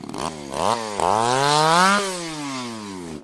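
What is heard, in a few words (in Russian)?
chainsaw close noisy sharp revving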